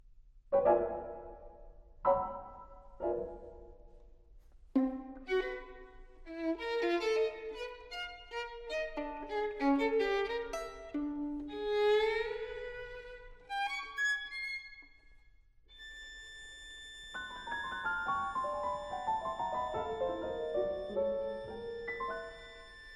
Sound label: Sonata for Violin & Piano - Mvt. 4. Enigmatic, but with Humor